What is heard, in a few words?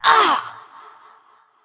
Grunt Hit; Paladins; Princess